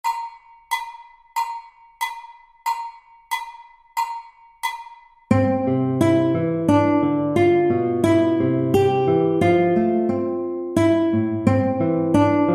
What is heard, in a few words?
2 guitars; 6 strings; B string; Shadow